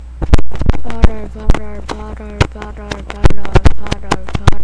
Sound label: bottle